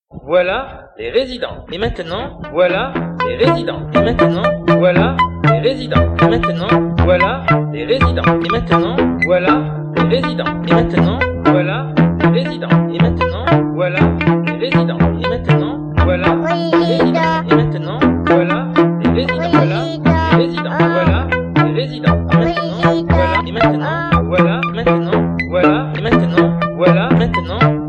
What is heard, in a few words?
Jingle